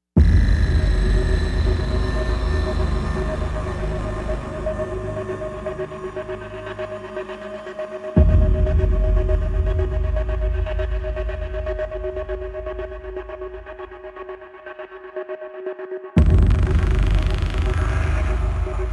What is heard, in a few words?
Razor Line Synths And Perc; Ninja Tracks